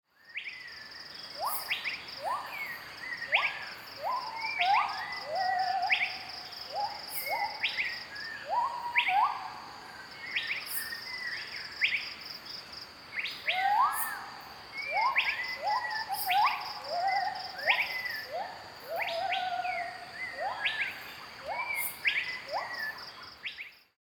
Gibbons Thai Rainforest

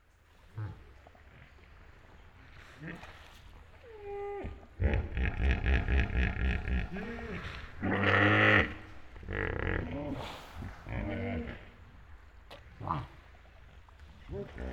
Hippos

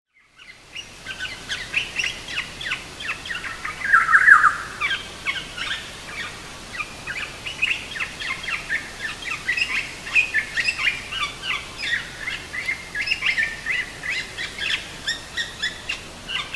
Olive-backed Oriole Mimicry

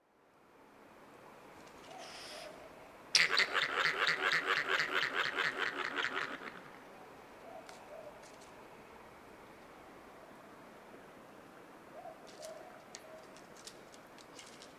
Yellow Bellied Glider

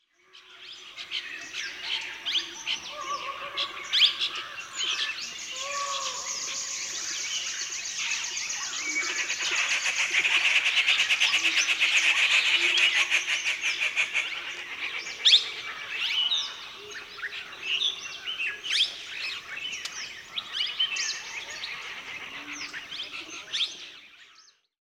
Indian Dawn Chorus Nagarahole

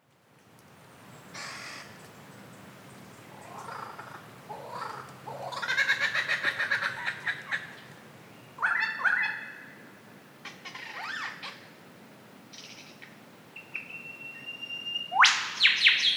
Lyrebird

